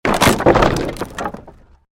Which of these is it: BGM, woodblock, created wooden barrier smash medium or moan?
created wooden barrier smash medium